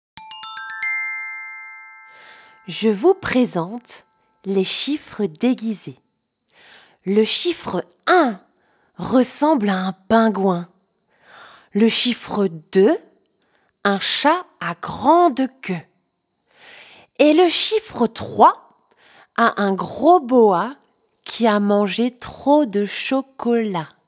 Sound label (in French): les chiffres rigolos, comptines